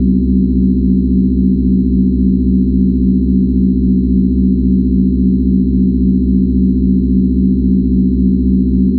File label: Drone Static; Drums & Drones